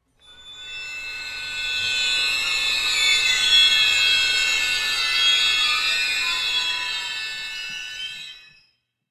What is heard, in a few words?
Strings; Mass Effect 3